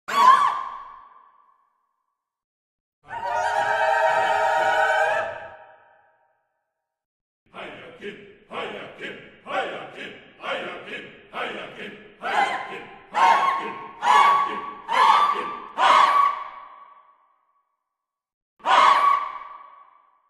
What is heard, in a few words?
scream